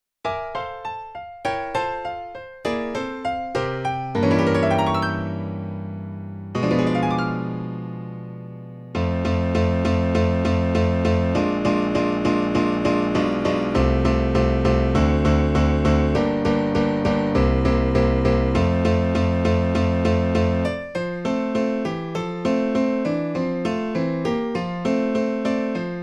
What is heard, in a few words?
Rondo in classical style; Daniil Korotin